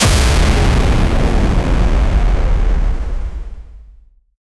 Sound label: the loud bass boost as